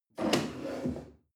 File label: chair wood place slide medium